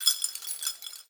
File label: Chandelier Crystal Rattle; Foley - Rattles